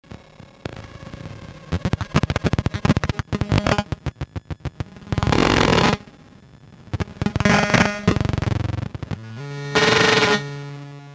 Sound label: feedback, hard soft synth